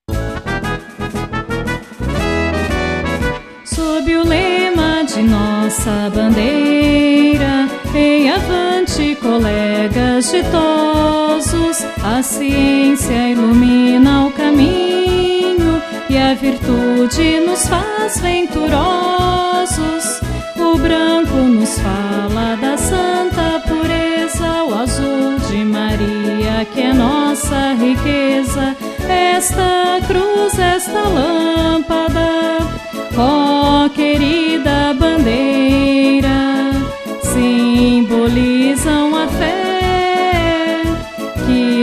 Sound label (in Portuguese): hino melodia